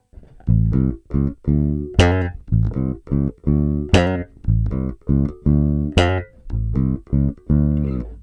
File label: bright; schecter; extreme 4